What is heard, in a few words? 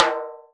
snare, timbales; Univers Sons; Basicussions